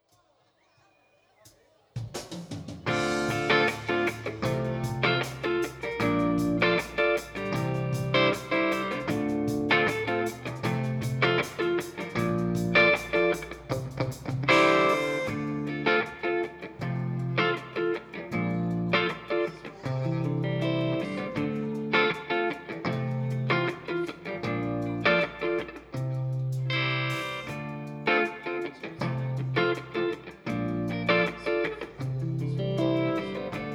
guitar processed

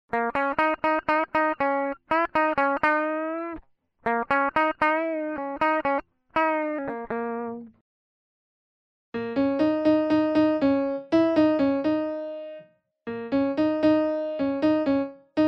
guitar extraction

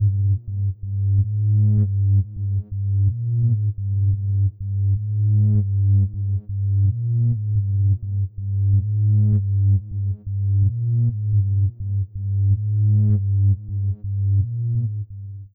bass original

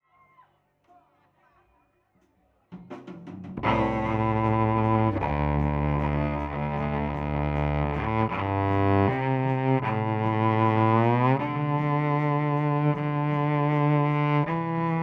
cello original

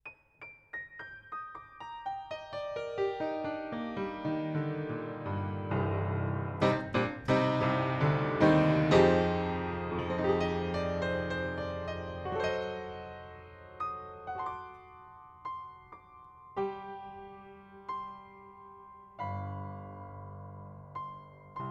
upright panels off 20cm pair

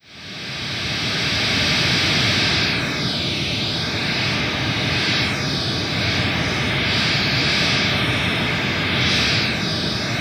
white noise to reinforcement

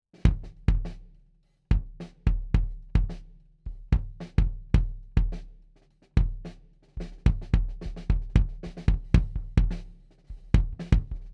kick head on closed

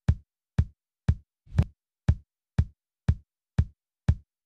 kick original

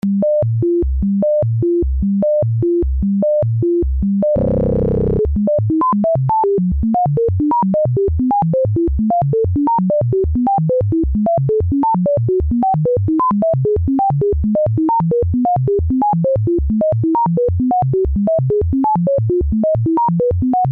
sample and hold

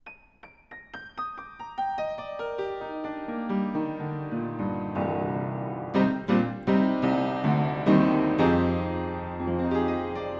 upright panels on 20cm pair

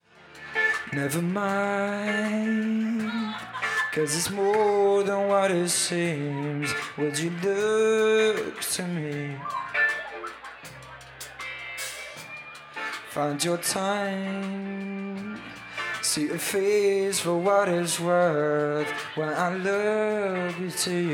lead vocal processed

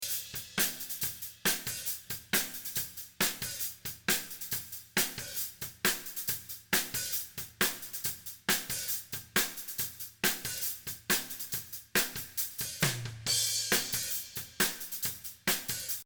original cymbals toms

